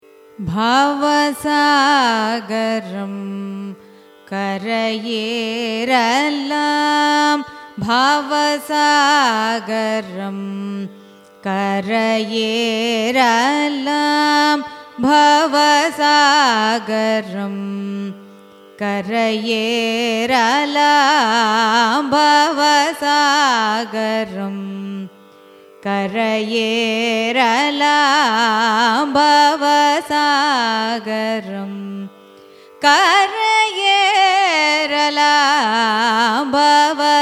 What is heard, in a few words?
raga shanmukhapriya carnatic; carnatic music